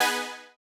Housey Stab B3; KG MegaSaur

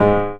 Piano Bass, One Shot Bass

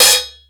Sluggo Drums, Drum Machines

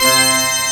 PIPE ORGAN C4; AKAI S6000 CD-ROM - Volume 1

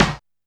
Snare, Alesis DM5

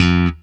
F2, Steinberger; InVision Interactive; Lightware VOL-1; Instruments & Percussions

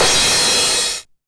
909 CYM Tape Sat; Goldbaby Tape808 & Tape909; The Tape 909

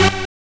MONOPOLY BIP, Synthy Tonal; Distored Reality

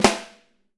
6X12 ALUMNUM, Living Drums

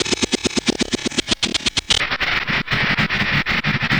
Gyrocopter, Electronic Beats; Sonic Foundry (Sony Creative Software); Myths of Technology

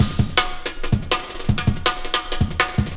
Labello 162, breaks; minihalfs

